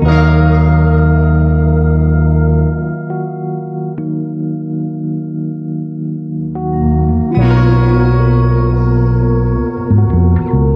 keys, sad loops

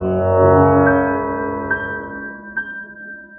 piano, Loops, Sad

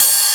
Hats Electro Open

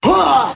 huh, Voice; ooh ahh

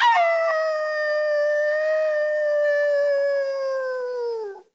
howling, Animals

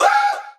"woo", male

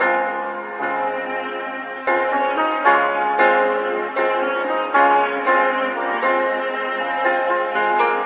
piano and strings, sad loops

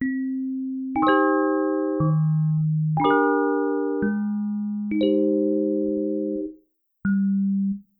twinkly, electronic loops

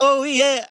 "oh yeah" SUNG

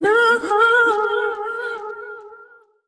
no SUNG; Voice; expletives

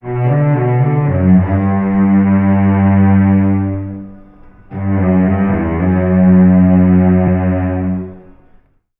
strings, Sad Loops

